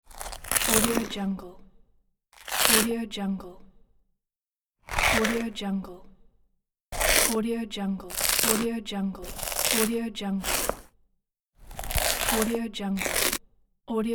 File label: juicy crunchy bites chewing